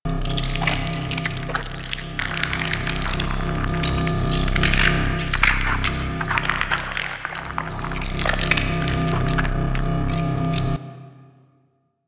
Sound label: Corruption Pickup Hostile Large Loop; Warframe; Missions; Zariman